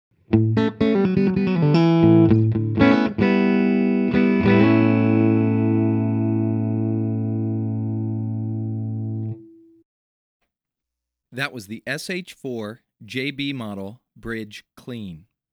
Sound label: clean